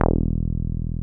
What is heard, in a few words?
303 E2 p; Drums In Your FACE!